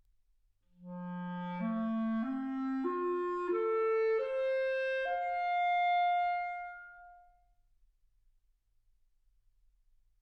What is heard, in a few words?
clarinet; single mic